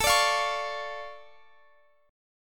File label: D Minor Major 7th, A, guitar